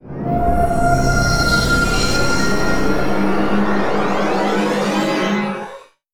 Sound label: powerup long; signal to noise